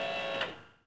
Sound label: Motor end, droids robots